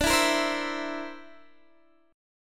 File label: D 7th Suspended 2nd Sharp 5th D, Guitar Open D